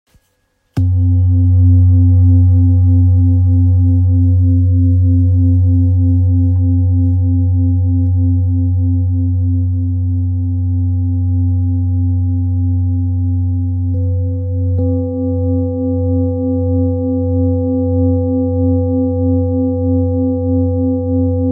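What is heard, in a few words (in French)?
bol therapeute fa, bols chantants tibétains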